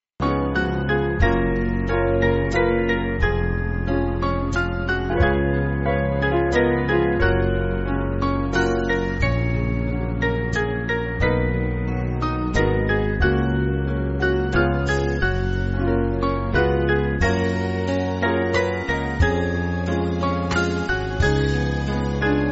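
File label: Band, Music Backing; I Take My Portion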